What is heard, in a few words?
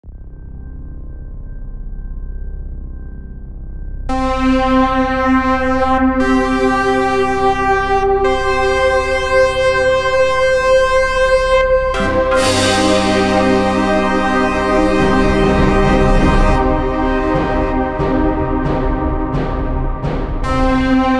Synthwave Cover; Also sprach Zarathustra, Sonnenaufgang